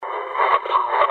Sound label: real evp during box session; urkel